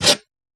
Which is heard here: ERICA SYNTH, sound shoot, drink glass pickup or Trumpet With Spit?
drink glass pickup